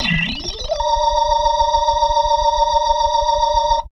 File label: B3 RISE A#, PLAYED